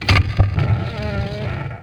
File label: door raccoon hall open; left4dead